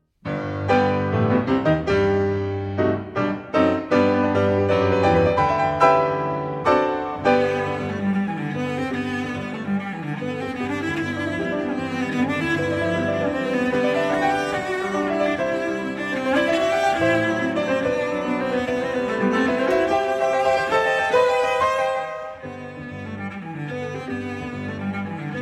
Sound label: Cello Sonata in G Minor Allegro Mosso